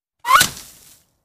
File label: Bullet Impacts With Whir In And Impact